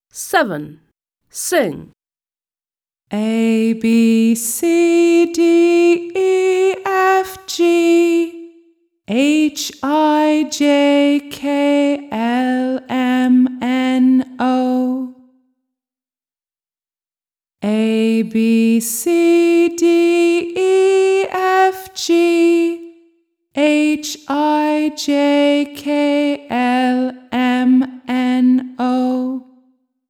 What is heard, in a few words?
song ABCDEFGHIJKLMNO; American; My alphabet book; Smart Book 1